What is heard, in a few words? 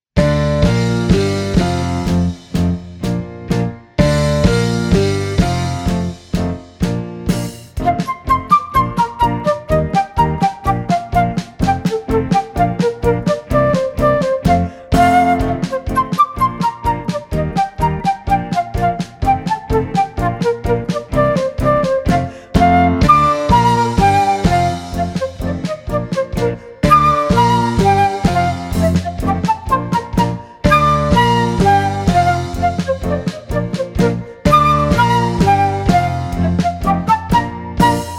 Flute Track